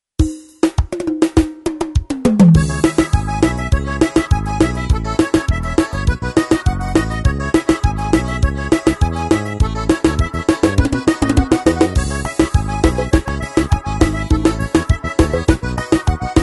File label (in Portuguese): ritmos forra